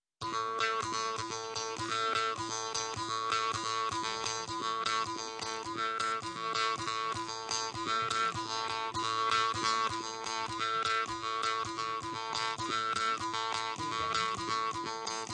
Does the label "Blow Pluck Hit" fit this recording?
Yes